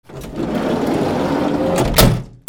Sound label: CARS